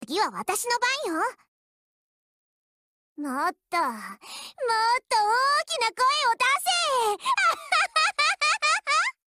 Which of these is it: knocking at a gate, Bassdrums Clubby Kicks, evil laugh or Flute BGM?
evil laugh